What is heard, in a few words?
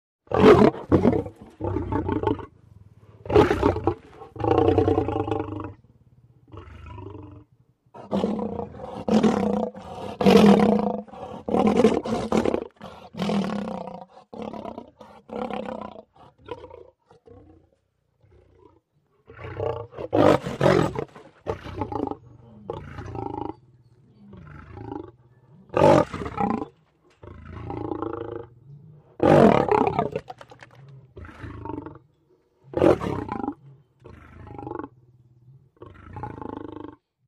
Lion Growls Snarls; big cats